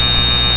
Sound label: SID drone, Noises, Analog; Xfer Records Serum; Serum Presets